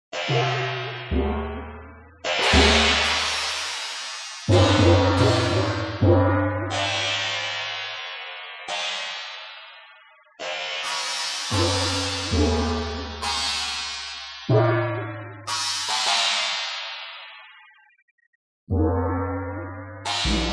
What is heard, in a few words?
Gongs; Csound; harmonia